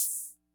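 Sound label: Ace Tone Rhythm Fever closed hi-hat, Drum Machines; 300 Drum Machines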